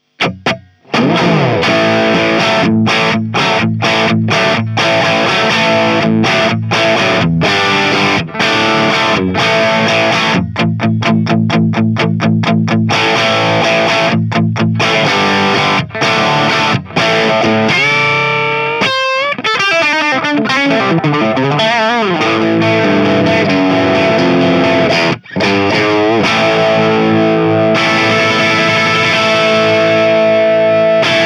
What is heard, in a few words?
Crunch ES335 Torpedo; Bluetone